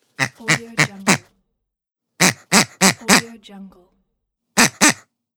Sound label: toy pig